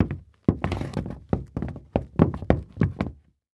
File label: Foley Footstep Golf Cleats Step And Stumble On Hollow Wood Surface; Foley Footsteps